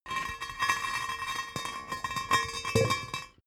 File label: metal heavy hollow rolling concrete